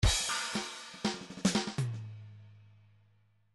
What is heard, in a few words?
midi file fills funk fill